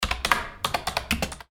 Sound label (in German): computer tastatur